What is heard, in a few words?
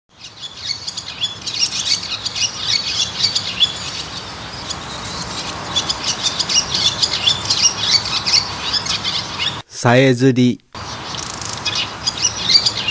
swallow; bird; azamidani